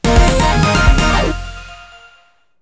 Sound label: TRACK START BOSS; maimai